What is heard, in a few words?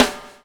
BUBBLE; Spectrasonics - Backbeat